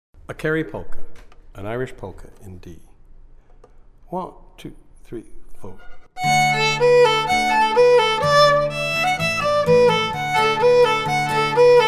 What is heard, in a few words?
Kerry Polka